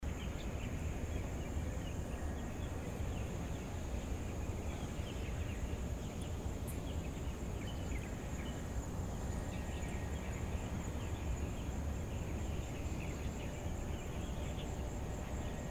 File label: Cemetery Taiwan ORTF Harpex-B